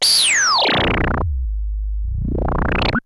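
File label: Sample and Hold Filter, SFX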